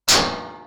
Shot

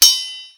Open Triagle

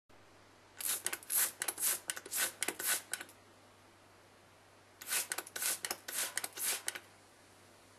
Spray Bottle

